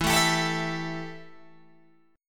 E Minor Double Flat 5th E Guitar Open G

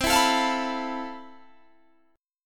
F Major Sharp 11th C

